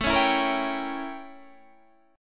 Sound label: A 7th Flat 9th Guitar Standard; A7b9 strummed; JGuitar